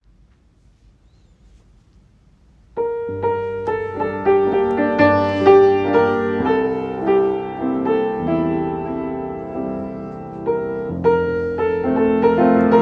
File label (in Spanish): Musica Adagio Grupo